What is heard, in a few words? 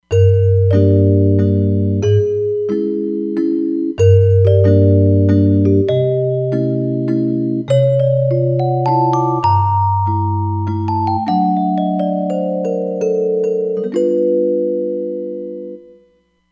Celesta, Roland Fantom XR